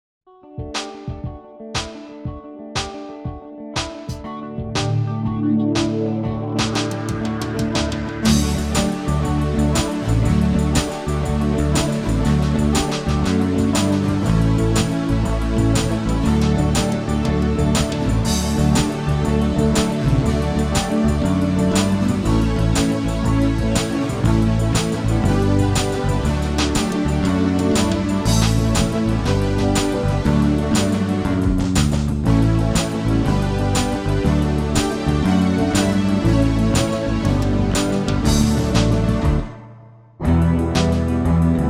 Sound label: Irish 120 bpm